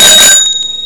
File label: BICYCLE; trasporti